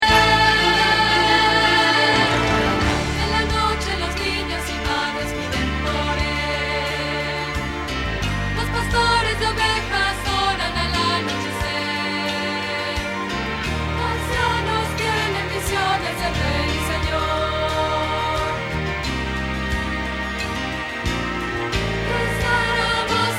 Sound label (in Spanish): Contralto